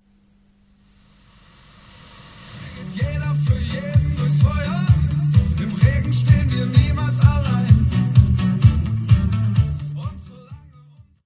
Röhrenverstärker; ELL800

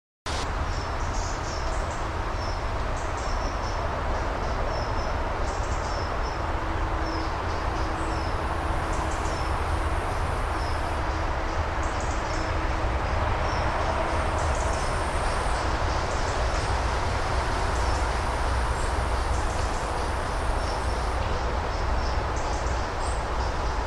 Wald mit Autobahn

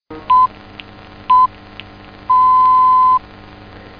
Zeitzeichen, 207 kHz; lw; dlf

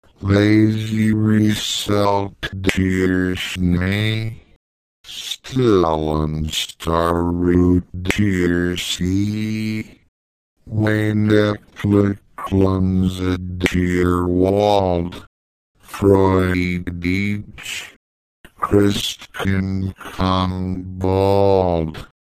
Leise rieselt

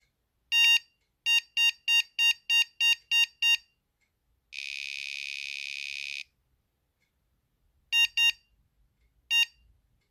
Piepfolge

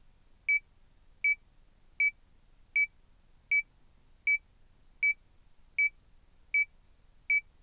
spule als Empfaenger; Ferritstäben

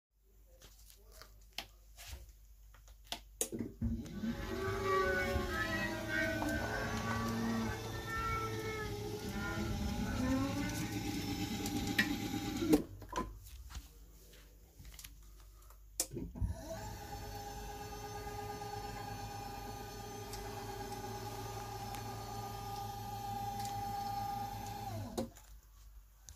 schriebtisch abwaerts Geraeusche

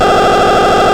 SDR Digitalsignale; 433MHz

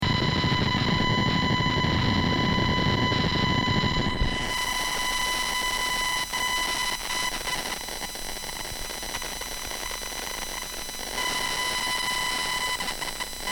geräusch

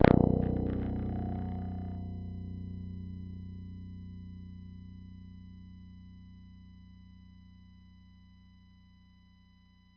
H Saite 11KHz; LTSpice XVII

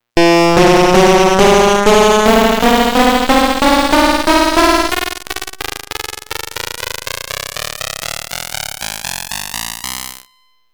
chromatik; Kinder-Keyboard

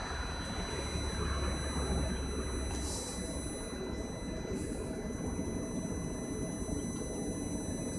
Luftgerät

